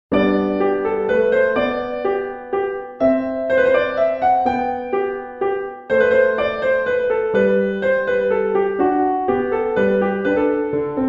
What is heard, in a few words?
Minuet in G major, Piano; 小步舞曲; Bach; BWV Anh 114; 巴哈